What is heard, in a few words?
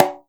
Perc Conga Slap; Ernys Percussion